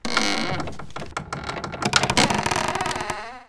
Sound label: creak; Mafie 1